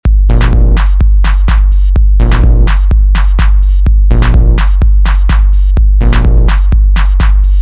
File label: afrotrap